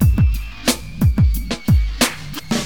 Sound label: Drums loop, HipHop; LiveAct Pool 1